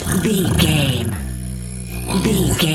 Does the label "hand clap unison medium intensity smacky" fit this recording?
No